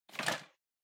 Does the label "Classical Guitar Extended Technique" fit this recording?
No